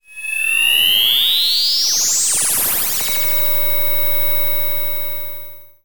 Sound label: sound design metalic ascend laser flares; zapsplat